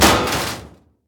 metal vent impact; physics

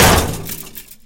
chainlink bash; scripted sequences, a2

